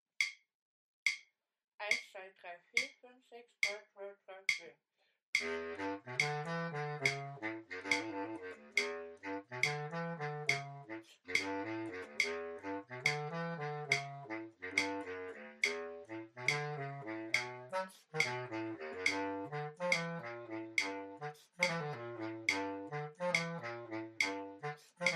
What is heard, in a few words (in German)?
begleitung; gypsy jazz jam